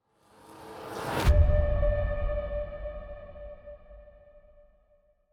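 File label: Music Orchestral Transition Pre race end; Forza Motorsport 6 Apex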